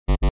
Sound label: Incorrect Answer; grammar games